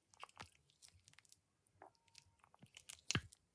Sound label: gel dispenser press squish, badezimmer; haus garten